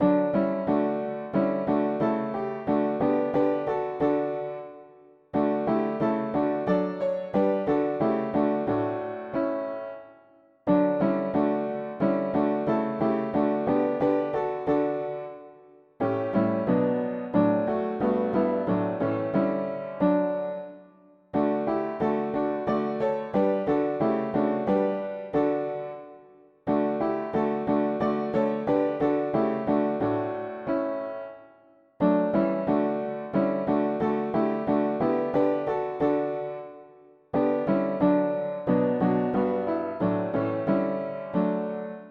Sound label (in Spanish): mono piano; hymns; Himnario pequeno